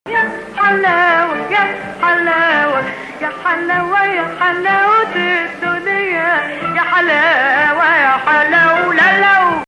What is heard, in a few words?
Ajam